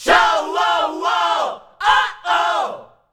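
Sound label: "schouououoh", MALES; SCHOUOUUOH; Best Service; Hyper Dance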